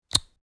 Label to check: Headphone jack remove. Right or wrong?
Right